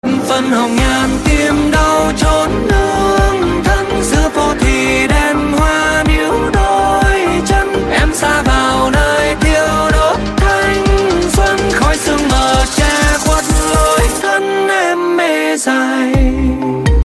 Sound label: Drum